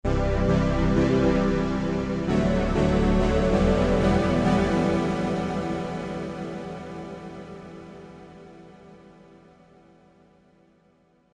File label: keys, ambient